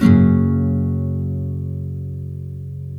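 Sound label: nylon F# major up, chords nylon; Spectrasonics; Hans Zimmer Guitars VOL-1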